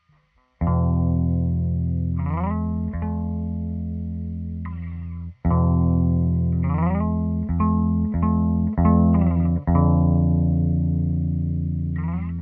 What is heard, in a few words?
Pretty soft guitar; Hylics